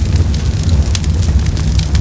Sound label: fireball